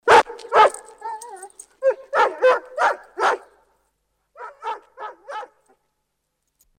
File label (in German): Schaeferhund